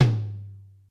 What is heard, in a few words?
XE8 TOM; Crypton - DrumBase5000